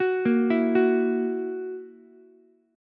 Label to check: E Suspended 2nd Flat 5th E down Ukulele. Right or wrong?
Right